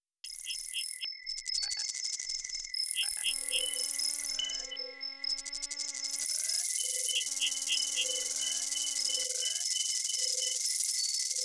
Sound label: insects